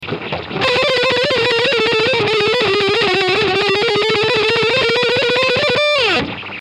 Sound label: warmup, guitar